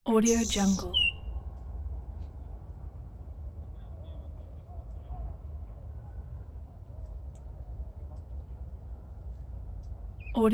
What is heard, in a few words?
nightingale in the park